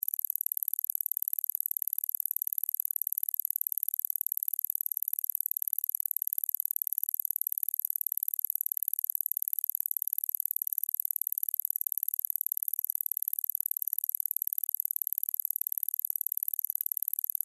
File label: Science Fiction Timer Beeps Counter Device; Communications